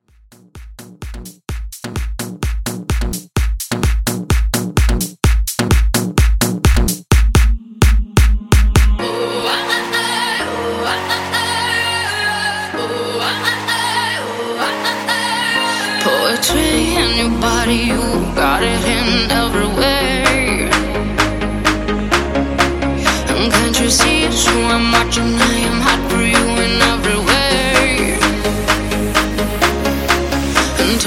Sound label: Afro Bootleg 128Bpm